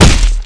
fists hit; Garrys Mod

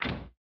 armor close; Factorio

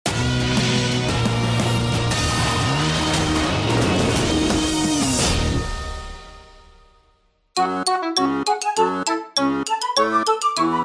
Rap; Angry Birds

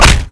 punch; Garrys Mod

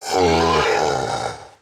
Male Death; Project Zomboid

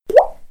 Tab Open; RimWorld Biotech; RimThemes; Hunt For Me

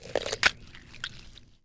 Unstuck weapon; Project Zomboid

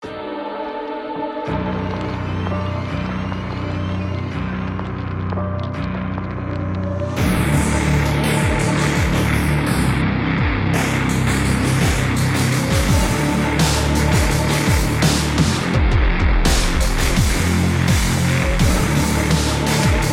Halloween Industrial Orchestral Music

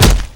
fists miss; Garrys Mod

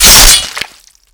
slash; Garrys Mod

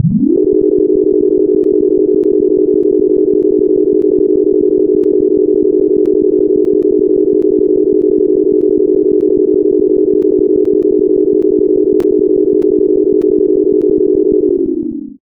elevator long; Undertale Yellow

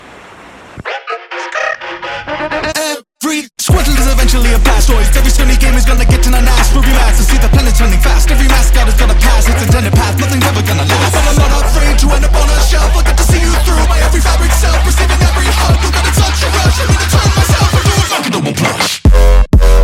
Rap Song; Animation

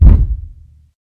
thump; Project Zomboid

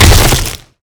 gore hit; killmove; Garrys Mod

